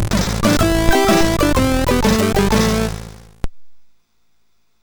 sid MOS6581R3; Heavenbound

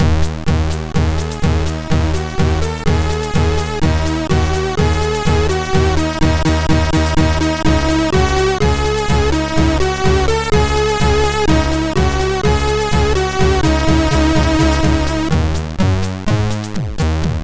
sid MOS6581R3; Smon Duper; DEMOS

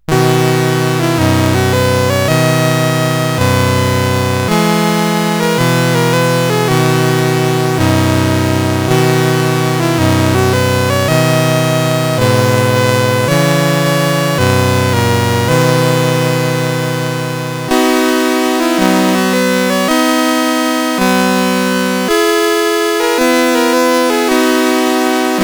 sid CSG8580R5

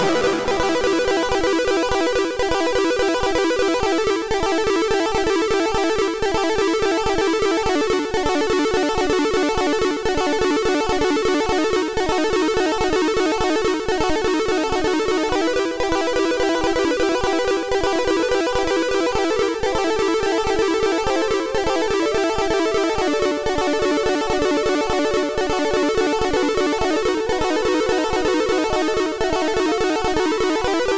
sid MOS6581R2

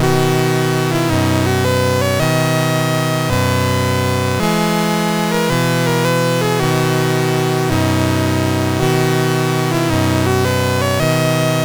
sid MOS6581R3

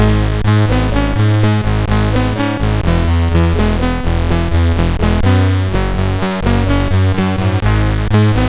intro, sid MOS6581R2

